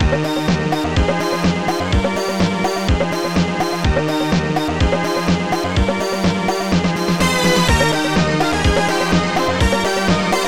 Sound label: game Music; digger; roll-out; Amos Music Bank